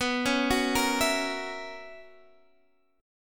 B Major 7th Suspended 2nd Suspended 4th B Guitar Standard; arpeggiated; x,14,11,11,11,12; JGuitar